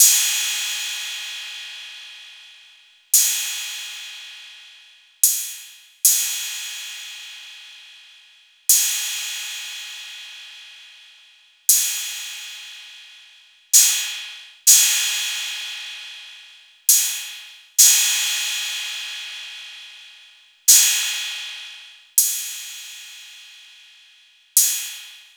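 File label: TR-808 cymbal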